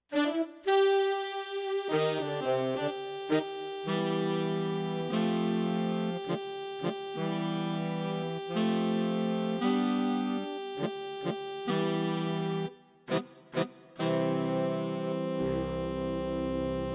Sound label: Ensemble Sax; Tyros5